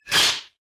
snake attack

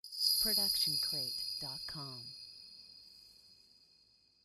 Shimmer Hit; SoundsCrate

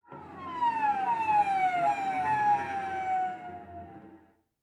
Cello Seagull Effect; SoundsCrate

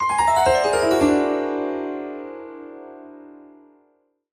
Mystery Arp; soundscrate

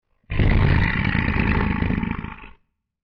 bear

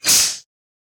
snake attack

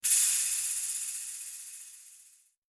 dying rattle snake

